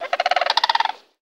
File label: Crane Calling Cluck